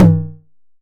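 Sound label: 909 mid tom; Goldbaby Tape808 & Tape909; The Tape 909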